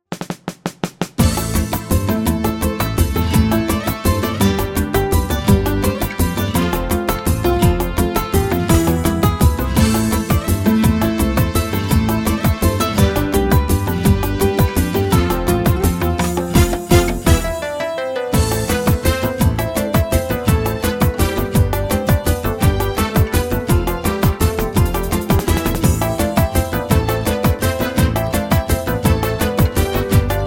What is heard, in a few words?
Flute